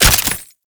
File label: Scaldra Pistol Reload